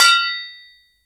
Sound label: IMPACT Metal Cling Deep mono; Phasmophobia